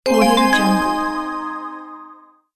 notification

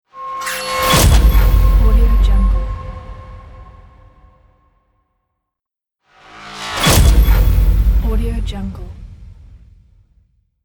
energy transition hit

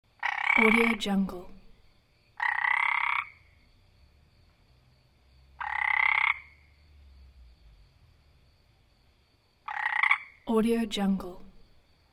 frog

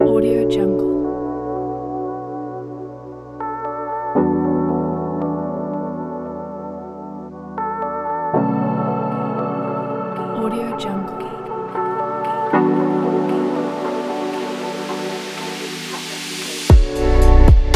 the atmospheric abstract; soundtouch

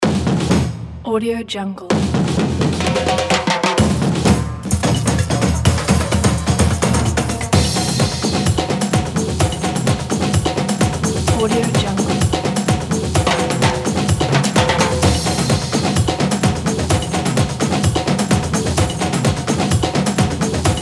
brazilian carnival

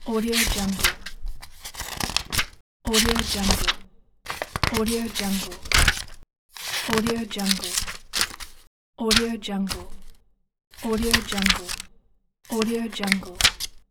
book pages flipping; albasfx

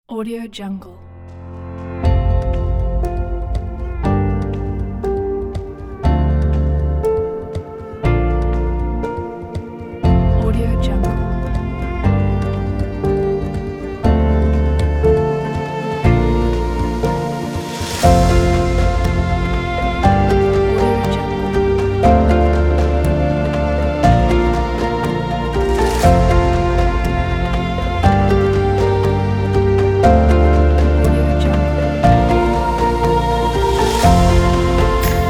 optimistic uplift mood

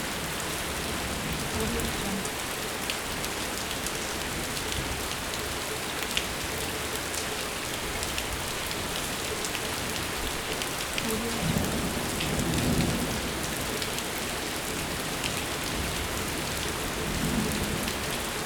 flooding city alley in heavy rain loop

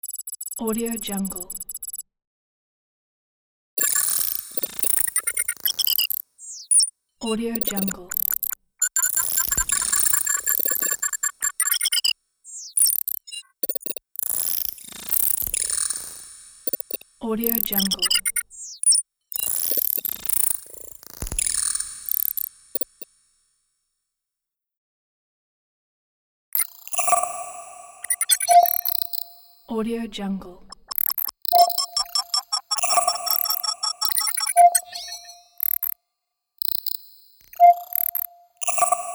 scifi processor computer

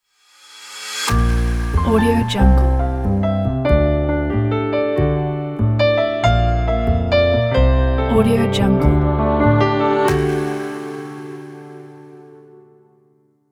jazz piano logo

